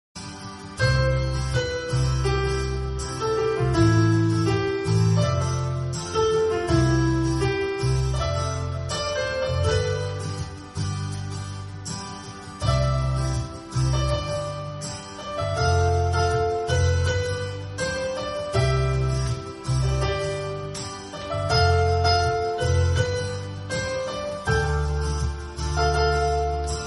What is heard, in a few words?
song instrumental ringtone